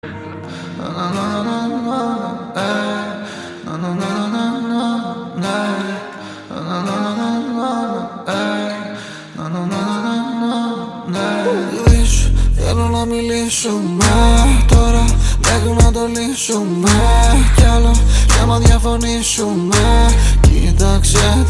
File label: jazz funk solo